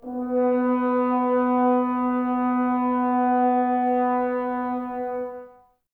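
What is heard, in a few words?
Brass French Horns, Woodwind Brass Ensemble; Sample CDs; Miroslav Vitous; F.Horns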